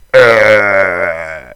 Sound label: death; HALFE LIFE 3